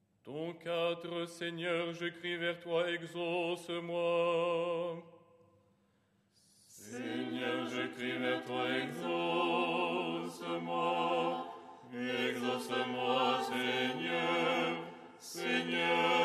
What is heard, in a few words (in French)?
stichere lucernaire ensemble